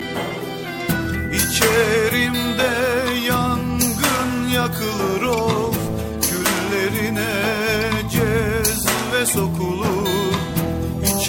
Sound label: Turkish